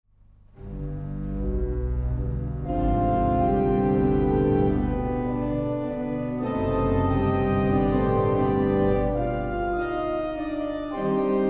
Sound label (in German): Praeludium, orgel; Aulfingen